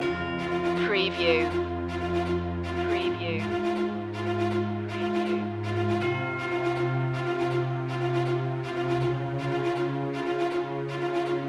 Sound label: land loop